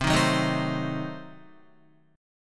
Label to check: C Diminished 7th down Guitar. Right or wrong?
Right